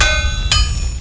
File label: Ui Repair; Knight Online